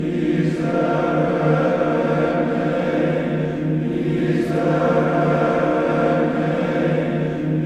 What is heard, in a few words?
MISERERE, Symphony of Voices